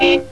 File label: horn